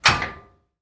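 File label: Washing Mach Close Door, washing machine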